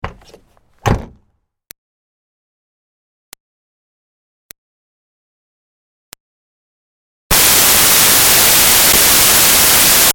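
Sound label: Car Smart Car Door Open-Close Fast; 2005 Smartcar Fortwo; Vehicles; Big Room Sound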